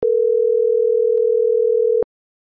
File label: ringback; Logitech